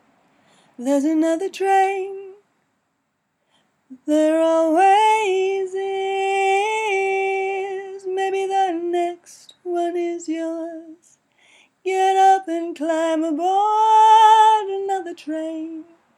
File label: chorus high